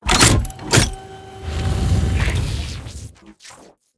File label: Heavy Tank Railgun; blue archive